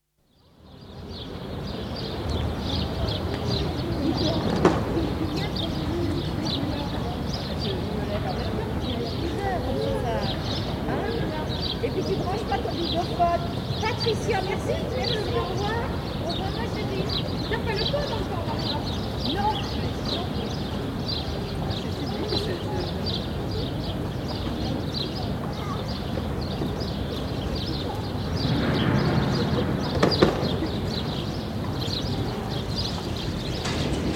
Crowds, Palais Royal Gardens, with birds, children playing, passing footsteps and speech; BBC